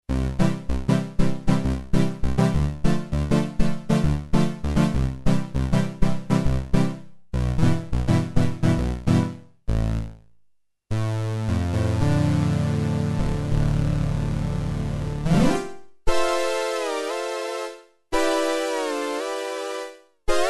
Oberheim

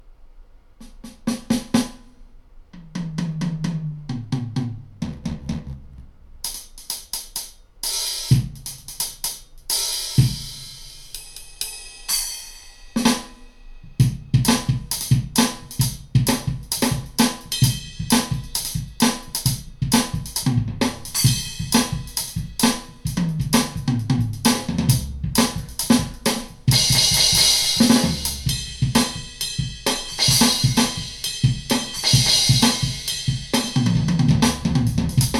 Laney DH80

